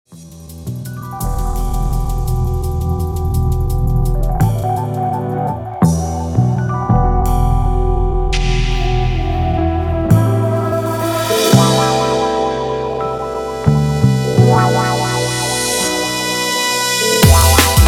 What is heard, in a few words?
Kinda Jazz